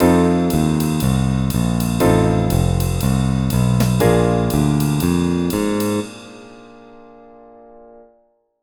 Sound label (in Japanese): ドラム テンプレ